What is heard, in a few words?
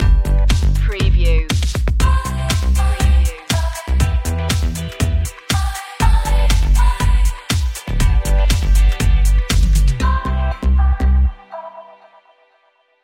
chic night